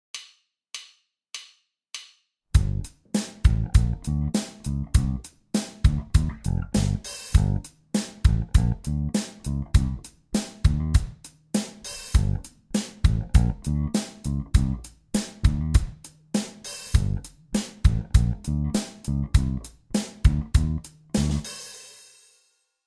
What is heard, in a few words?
Aeroplane thumb only, bass